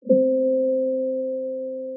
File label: Guitar C filter